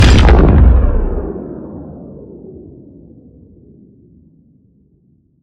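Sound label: Sci weapon Shot Large Gun Titan Cannon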